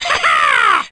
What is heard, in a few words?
"ha ha" cartoon; ooh ahh